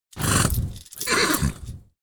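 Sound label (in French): cheval hennissement; MOBICLIC130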